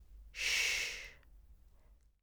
shhh

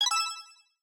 Item Collected; Legends

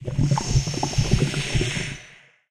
UI Prop Cauldron

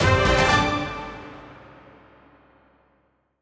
Legends Victory Jingle

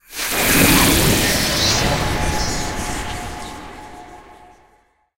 Absorb Buffs; Boss Lych

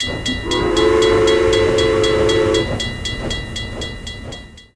Train Warning

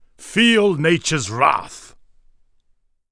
battlehowl; obyn; matchup; Obyn Greenfoot

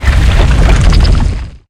Rock Platform Move

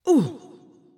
Damage; Adora

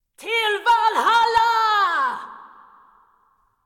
Sword Charge Viking

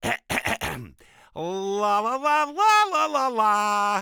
vocal warmup; jake

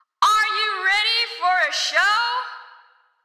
Place Psimbals

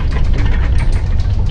Gears Start

